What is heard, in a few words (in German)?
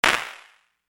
Raumimpuls